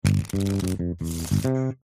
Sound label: shuffling